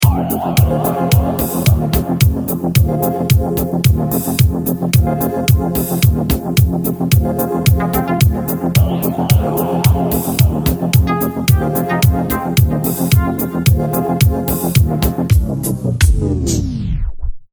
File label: start action, music; hotlinemiami